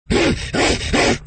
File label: snarl